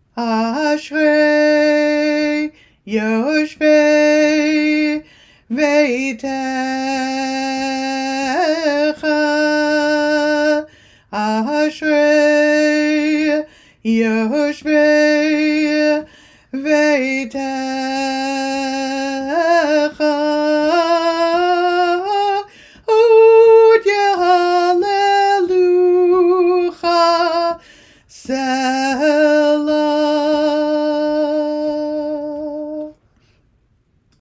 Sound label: Ashrei song